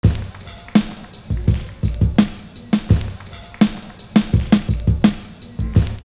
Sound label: DRUMMER + CLAP; Univers Sons; 84 LOOP